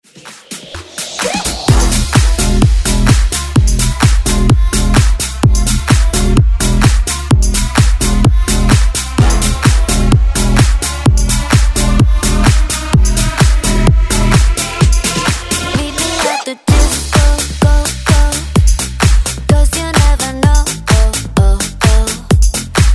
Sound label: Dance